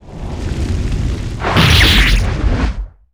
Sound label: Dragon stunning blow